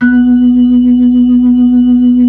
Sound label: B-3, Blues; Roland LCDP10 Keys of the 60s and 70s 2